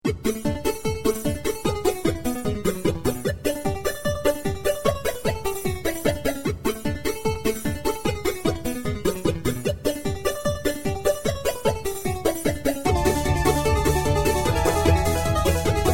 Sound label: Chip